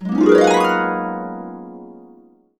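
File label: GB major, Harps; USB Soundscan vol.62; AKAI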